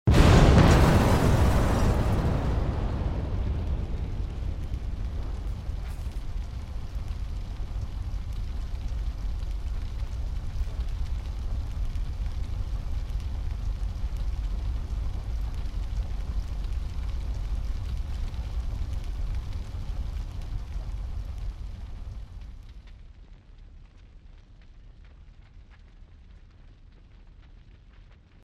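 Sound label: explodes in the distance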